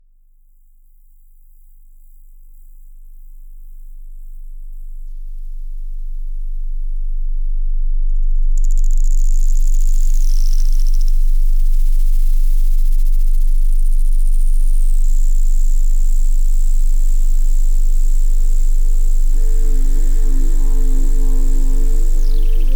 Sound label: buchla Arp